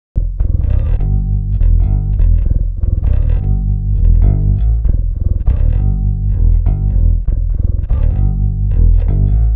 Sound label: bass, pitchshift; i3dsp quattro x